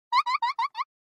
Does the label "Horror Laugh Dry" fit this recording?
Yes